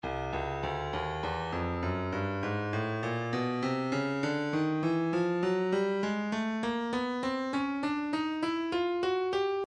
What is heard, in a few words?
percussion midi files hi hats 050 hi hats; Stylus